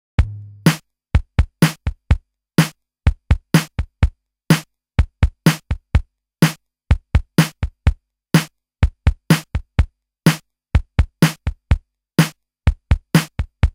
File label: DMX PATTERN